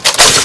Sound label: volt clip out